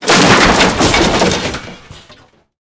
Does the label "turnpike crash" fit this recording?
Yes